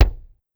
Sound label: Kick, Clavia DDrum AT, Pitched Down